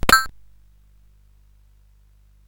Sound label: SFX; Narco Police